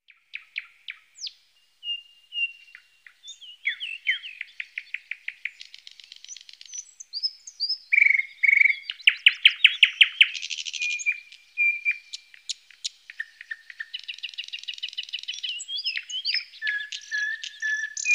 noordse nachtegaal thrush nightingale

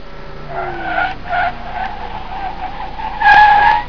cars

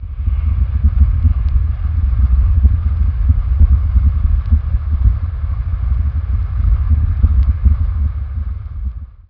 nature

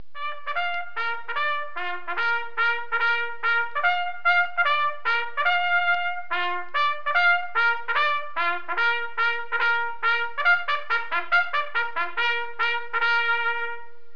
musical

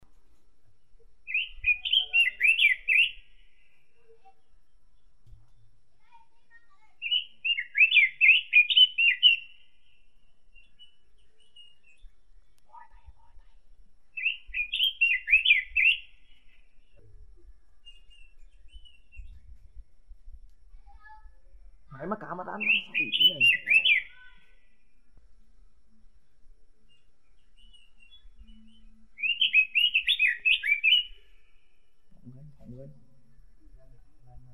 robin robin

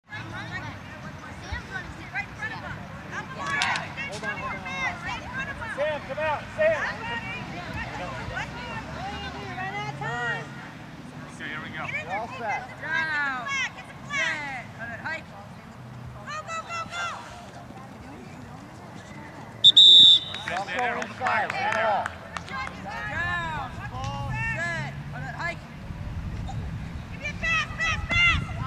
kids playing football

